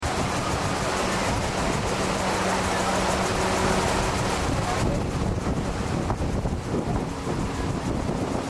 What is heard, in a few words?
iguazu falls